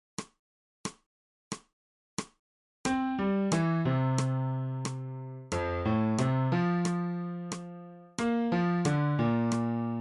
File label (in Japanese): 新フレットレス